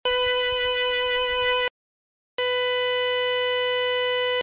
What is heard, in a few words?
violin sampled